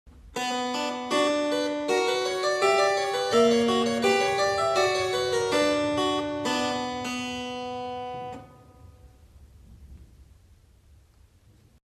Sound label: Montagem Harpschord; oficinas; colagem musical unila